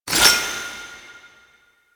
Citrine Crystal Pickup; UI; Pickups; Warframe

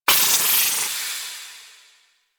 Pistol Reload Steam, weapons; Warframe; Thanotech

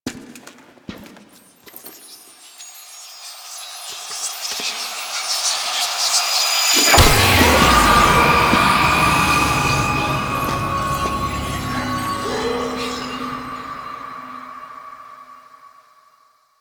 Applying Veil; Warframe; The New War; Stolen Plates

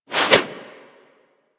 Zipline Brake Foley; Gadgets; Parkour; Warframe

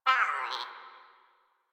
Flying Robot Voice; Warframe; Veilbreaker; Quests; Ambience; Kahl

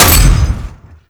Cross Bolt Fire; Warframe; Weapons

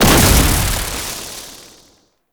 Grenade Explosion Electricity; Warframe; Entrati; Alchemy

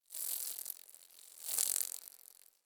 Insect Fly By; Ambience; Misc Outdoor; Warframe; Infested Eris

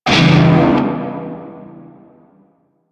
Shawzin Phrygian Chord E; Warframe; Narmer Shawzin

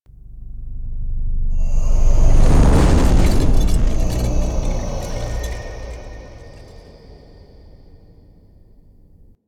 Sentient Throne Room Turn; The New War; Natah

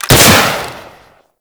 Skiff Missile Fire, weapons; Grn Skiff Missile

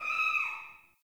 Cave Ocean Creature Close; Ambience; Midrath Island

